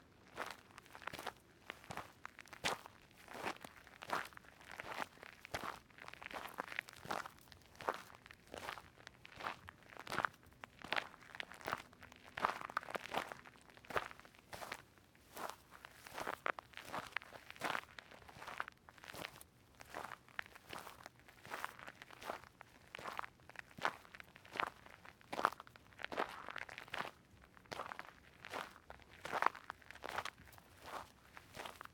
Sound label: footstep basic dirt normal loop; anemoi